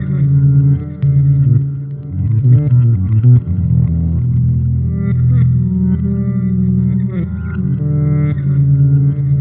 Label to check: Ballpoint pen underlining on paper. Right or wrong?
Wrong